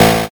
zap

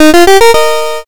levelup

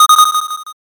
alarm

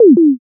poyon

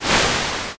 swoosh

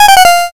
lose

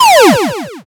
laser

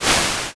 swoosh

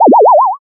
teleport

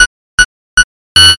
countdown

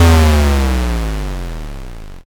explosion l

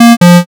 error

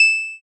ding

